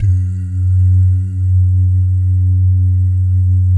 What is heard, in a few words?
BS DOO, Vocal Planet Jazz & FX; ILIO; JAZZCOMBO